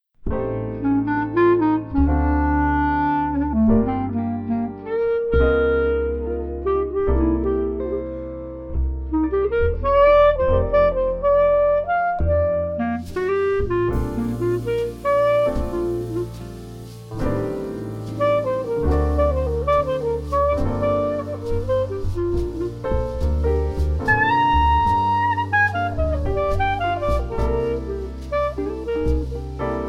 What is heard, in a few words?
Jazz Elements Theme